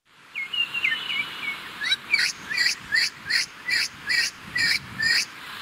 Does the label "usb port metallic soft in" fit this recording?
No